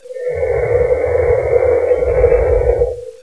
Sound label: wind low; Notrium